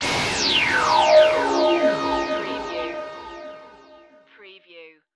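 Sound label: Production Element Sweeper; swell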